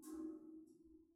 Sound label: catwalk footsteps